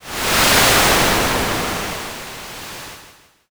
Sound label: wind